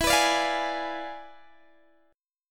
E 7th Flat 9th E down Guitar Open G

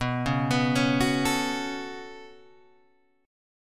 B 7th Suspended 2nd down Guitar Open G, chord

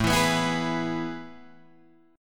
A Major 7th Suspended 2nd, Guitar, Drop D

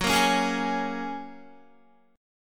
Gb Major Add 9th, down, Guitar

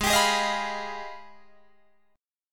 Ab Minor Major 13th, down, Guitar, DADGAD

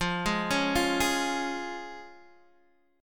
F Minor Sharp 5th F down Guitar Open D

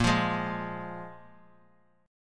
F Minor Double Flat 5th A sharp

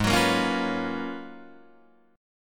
Ab Minor 13th, Ab, Guitar, Drop D

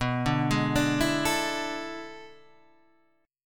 A Major 7th Suspended 2nd Suspended 4th

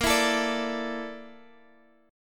Bb Major 7th Flat 5th, Guitar, Half Step Down

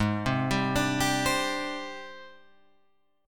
Ab Major 7th Flat 5th Ab, down, Guitar, Open F; arpeggiated; JGuitar

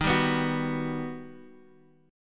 G Augmented Major 7th

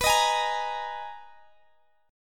Eb Suspended 4th B; Ebsus4/B; strummed

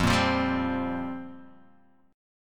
F Suspended 2nd Suspended 4th, Guitar, DADGAD; 3,1,3,0,3,x

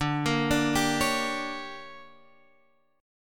D Major 7th Suspended 4th Sharp 5th, chord

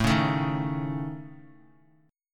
A Major Flat 5th, Guitar; strummed

